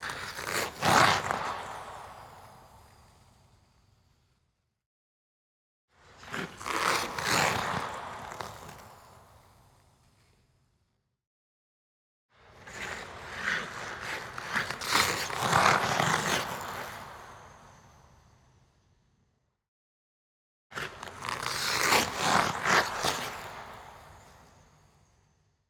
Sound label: ICE HOCKEY skate turn open hip 3 step 180 degree medium x 4 right to left; Sun-Smile-Island